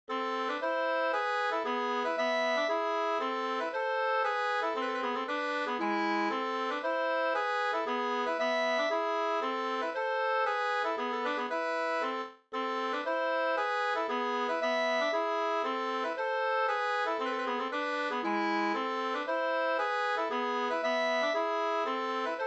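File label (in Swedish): polska, tvåstämmig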